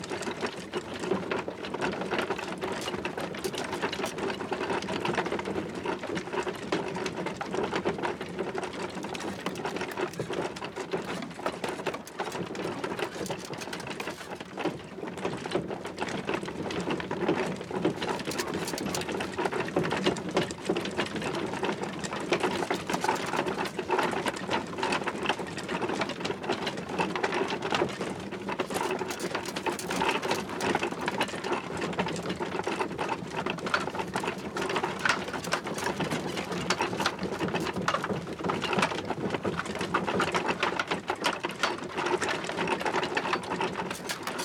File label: horse pair vehicle drive; teardown; ps5 windows xbox series xs